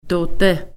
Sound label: throat